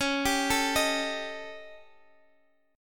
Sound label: D sharp 7th Flat 5th, C sharp